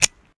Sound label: weapon foley drop; Real Guns Sound Pack 2